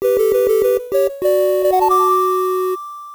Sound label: sleep; panicpumpkin